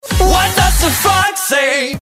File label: fox